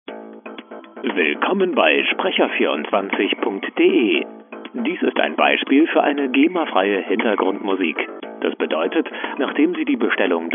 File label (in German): musik